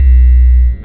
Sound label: Bass B2 main loop normal; 主播女孩重度依赖